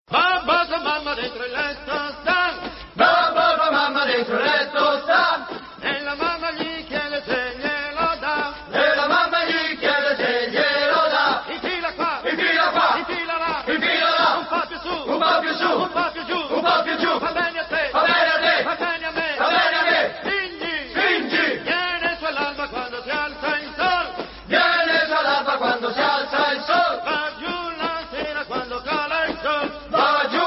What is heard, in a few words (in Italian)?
coro